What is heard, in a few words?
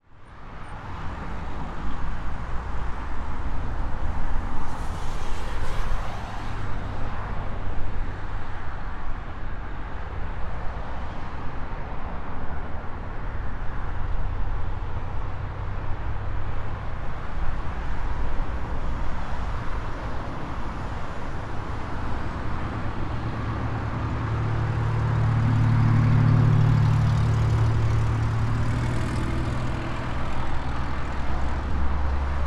Ambience, Spain, City Motorway, Traffic Circle; soniKSound; General Ambiences I